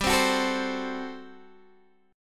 G Major 7th Suspended 2nd Suspended 4th G down Guitar Open G